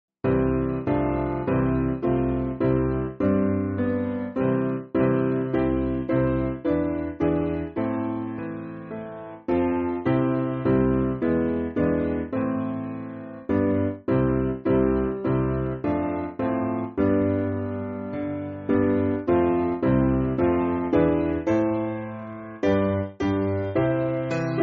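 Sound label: Piano, Music Backing Files for Churches; Descend O Holy Spirit; Ewing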